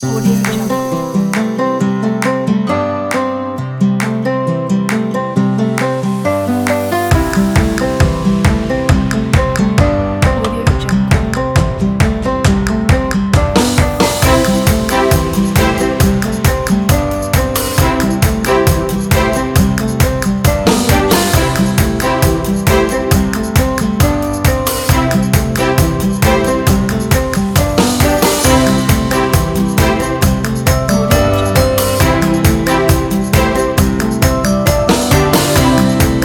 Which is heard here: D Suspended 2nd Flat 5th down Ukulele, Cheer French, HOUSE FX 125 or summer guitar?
summer guitar